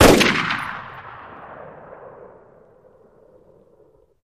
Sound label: Gun AR-15 Single Shot Fire